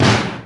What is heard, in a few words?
snare drum sound F sharp key, Royality free snare drum sample tuned to the F# note